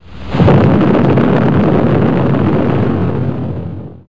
nuke; quake2; coop

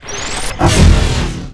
gladiator attack; quake2; coop; bfggladiator

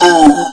pain; quake2; coop; bfggladiator

